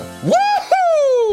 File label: woohoo